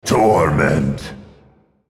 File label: spellcast effort; Yorick; en US